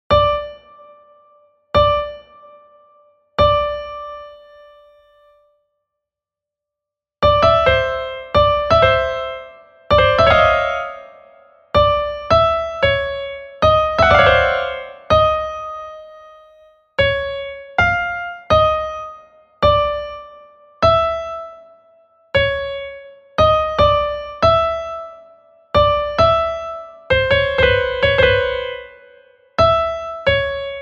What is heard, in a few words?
Piano Party